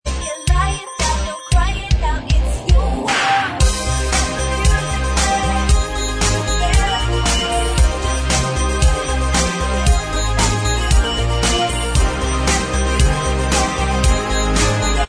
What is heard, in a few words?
Bvox